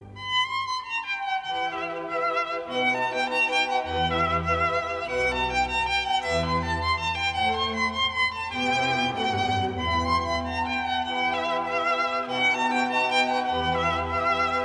Serenade No. 7 in D Major, K. 250 IV. Rondo Allegro; Haffner; Mozart, Wolfgang Amadeus